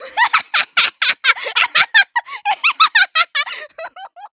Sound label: Laugh; easyrpg; WitchsHeart